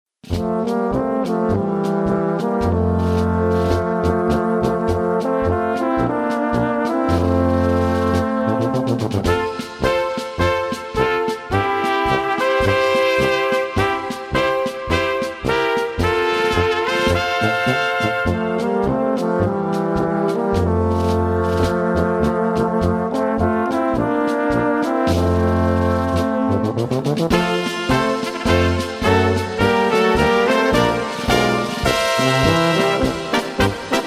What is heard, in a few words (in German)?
Suedboehmische Polka